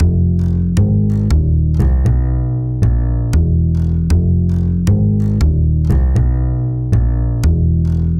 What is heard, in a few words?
Loose Foot Bass; Ableton; Breakbeats by KutMasta Kurt